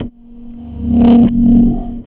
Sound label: SIDEWINDER, AMB. FUZZ; Spectrasonic Distorted Reality 2